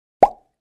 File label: Chat Pop Up Sound